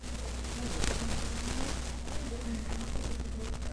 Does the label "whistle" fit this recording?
No